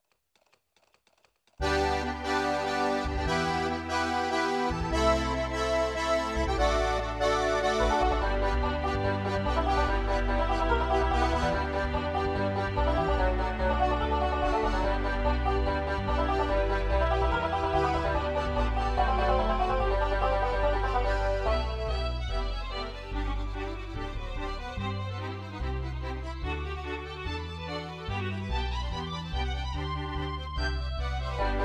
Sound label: country